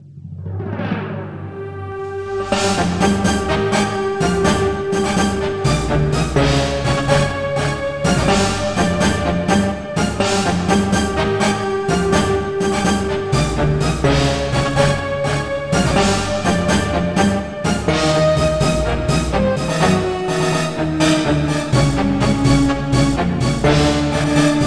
cartoon end title

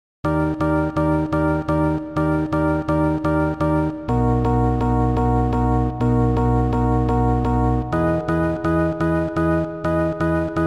disco

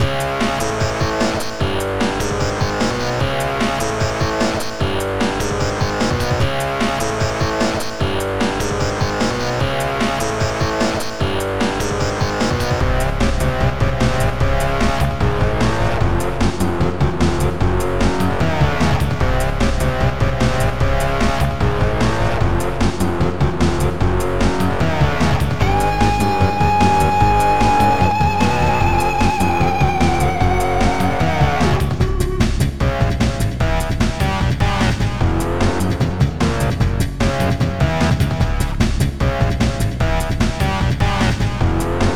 rock and roll

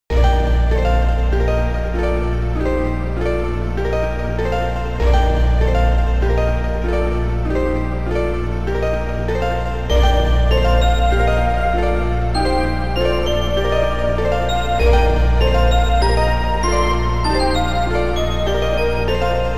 soft arp

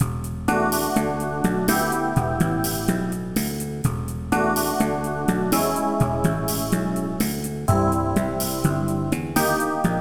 prova bass

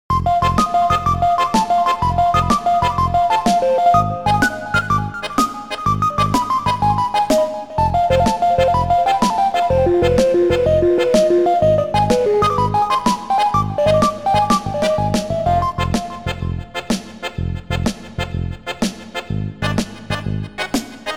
polka

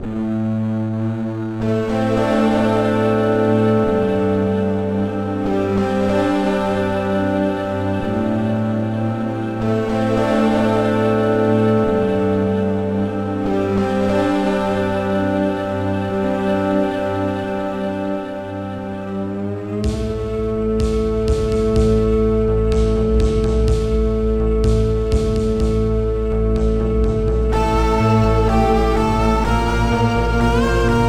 oriental sound

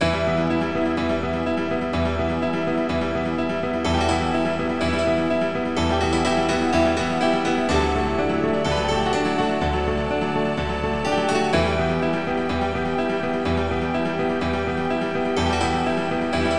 oktapiano 16bit

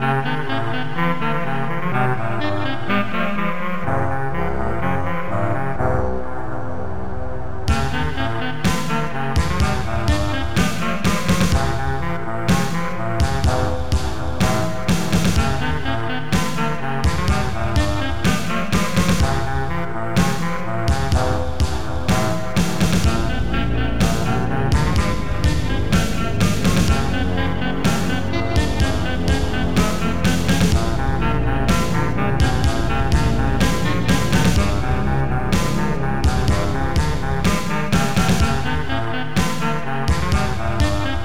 sax

